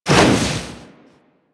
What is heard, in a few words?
Burst; Warframe; Purgatory Warrior